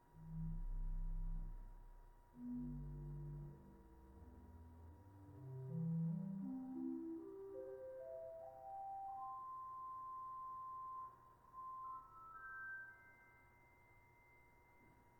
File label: swell stopped diapason, organ; 16’ Lieblich Gedackt; The name Stopped Diapason is the traditional English equivalent of the German; Swell Division ( East Chamber)